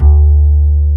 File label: UPRIT BS D2, WOOD BASS